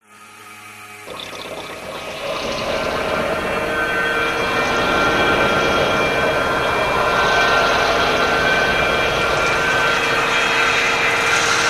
Plasma Leak Energy Puls

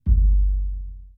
Sub Impacts Hollow; low sub hits

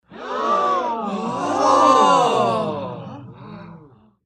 Gasp Oooh Wonder Sml

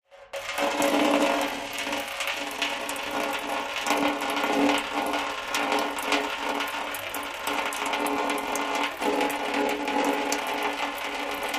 Water Stream On A Tank

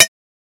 Bullet Shell Copper, shell casings fall

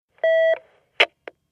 Intercom Beep Line Ha, futz intercoms

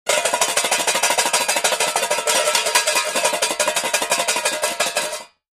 Noise Maker Metal, windup

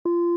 Button Beep Rapid, beeps

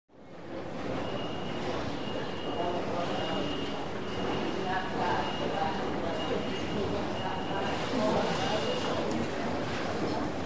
Leave Subway Platf, subway

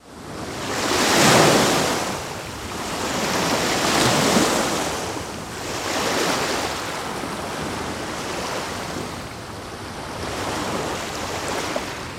Waves Hvy On Beach Loo

